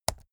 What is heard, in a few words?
keyboard laptop key press fast hard